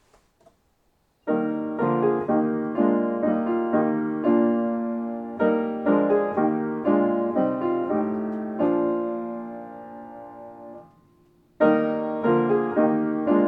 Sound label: Music